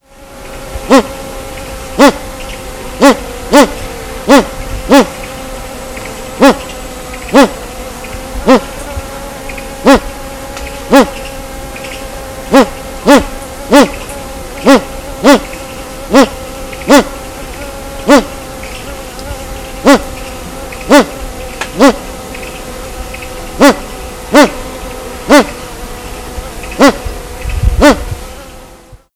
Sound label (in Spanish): garza colorada